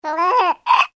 human baby 3 months short laugh; Babies (Sound Effects:Voice); McKinney Sound

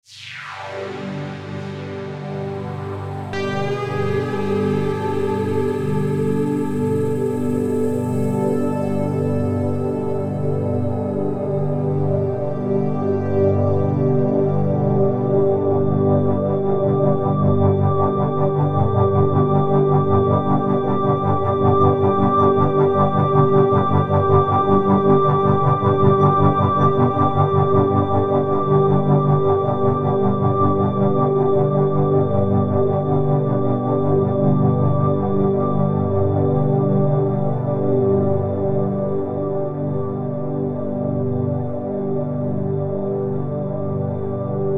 Bed Synth Sweeper Chopper; Blastwave

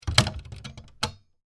Cabinet Door Open, Antique; Blast Wave

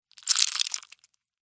Insect, Eat, Bite, Bug; Squish, Slimy, Crunch; Animals:Insects; Insects Eating; Blastwave

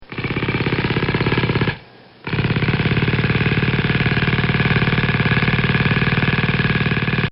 Air Hammer; Hammer Sound Effects; Tools

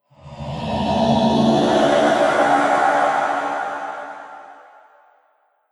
Sci-fi Monster Laser Lizard, alien

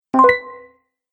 Game Quest Idea; video game

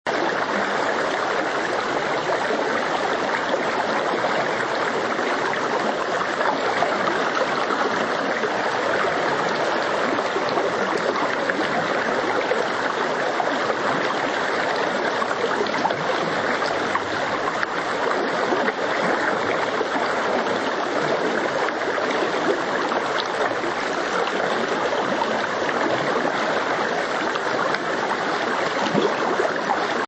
Brook Low, Constant rushing of a country brook; Brook Low Pitch; Rivers; Nature

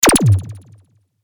Laser burst short, Laser Burst: Short Pulse; Lasers

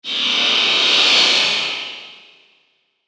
Energy, Field, Burst, Scifi; 5.1; Sound Effects:Science Fiction; BlastWave

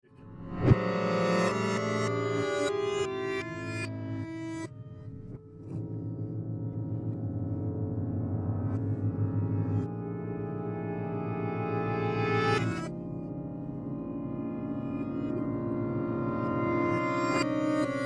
Piano Notes Reversed; Plucked Piano Strings , Played Backwards

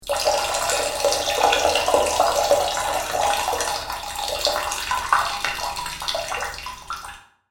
Urination Sounds; Urinating Sound Effects; human sound effect; Urination Sounds 2

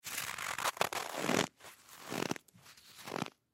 Snowball Make Grab Snow Form Snowball; Ice (Sound Effects:Weather); Blastwave

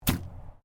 Archery Hunting Bow Firing; Various archery sounds; Miscellaneous Sports

